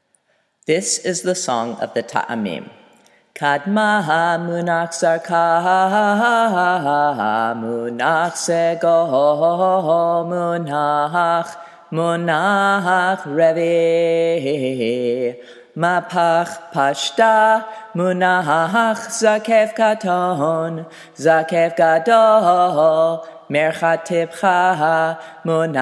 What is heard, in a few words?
taamim